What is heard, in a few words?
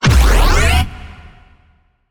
Designed Puncher